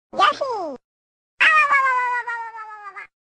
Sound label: sms; yoshi